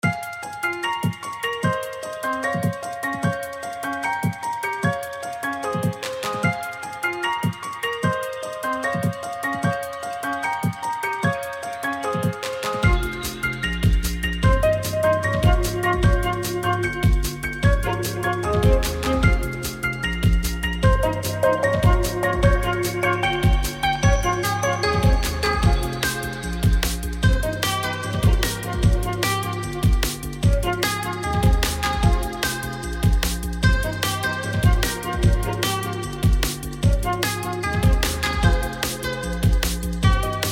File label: Forest; Forest 02 - Level 5-8; Inukari Chase of Deception; xbox series xs